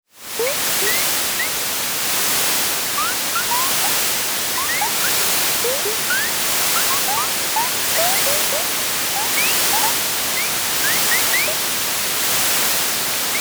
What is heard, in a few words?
river small stream